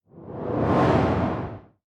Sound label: damage hits explosions, Warships